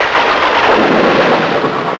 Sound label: FAIRLIGHT; AMG - Vince Clarke Lucky Bastard